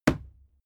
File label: fight impact sweetener cloth; nahkampf; soundpool